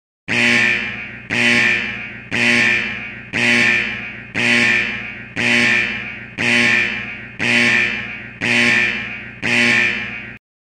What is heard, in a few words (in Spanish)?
Alerta roja Efecto de sonido